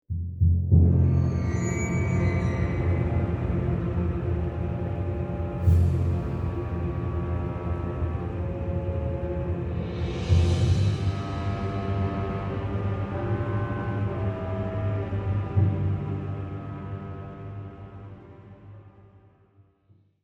Evil Eerie Bed Foundation Underscore Loopable

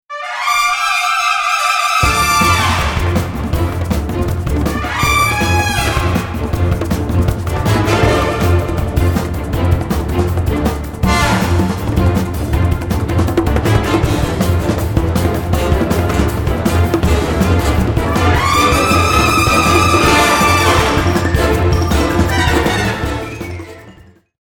Action Fight Music

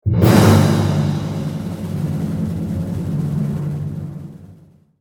Generic Low Timp

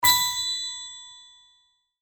Musical Ting; pantomime backing tracks

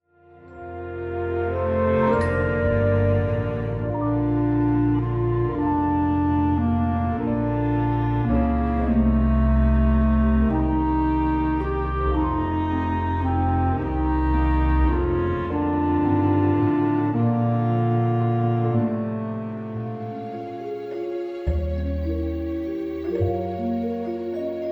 Lullaby Medley

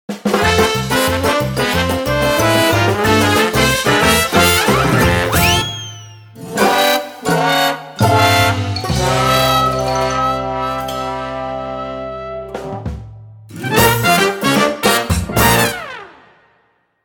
Sting; pantomime backing tracks

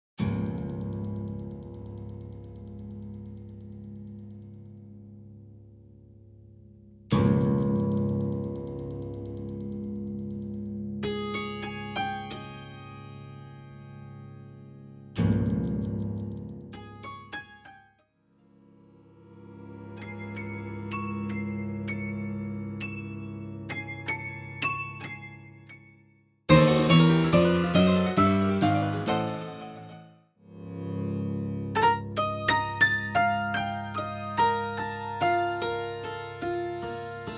Gangster Riffs

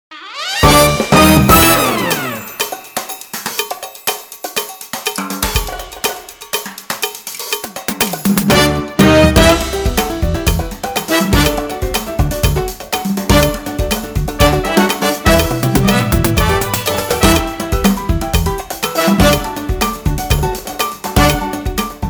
Conga

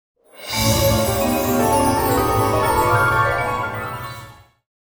dreamy magical spell

